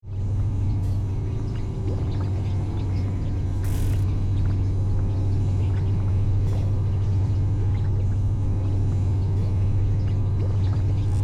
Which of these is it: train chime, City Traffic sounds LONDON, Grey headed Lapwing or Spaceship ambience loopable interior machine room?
Spaceship ambience loopable interior machine room